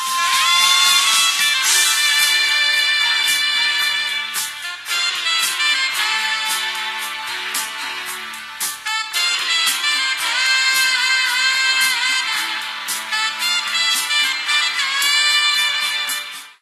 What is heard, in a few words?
sax; ss13